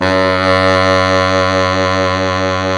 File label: SAX Bass Sax F#2